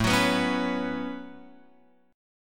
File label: A 6th Add 9th Guitar Open F, chord; A6add9; strummed